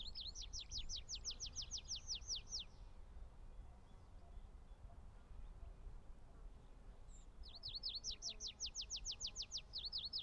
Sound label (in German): Baumpieper Anthus trivialis, vogelstimmen